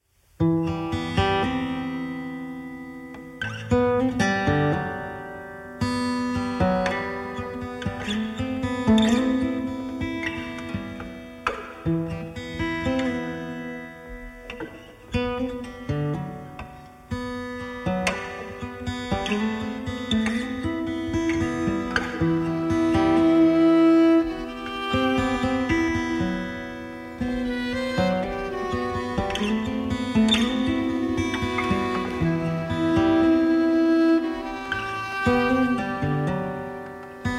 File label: Acoustic Version